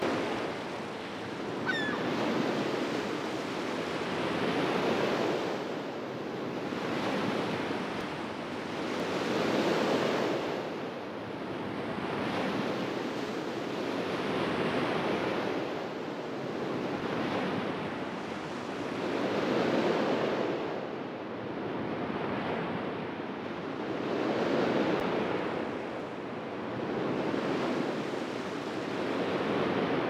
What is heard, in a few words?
Beach Sounds Soundscape